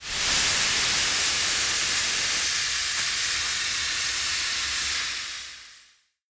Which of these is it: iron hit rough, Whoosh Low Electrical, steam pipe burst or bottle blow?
steam pipe burst